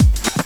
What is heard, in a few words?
dance music rhythm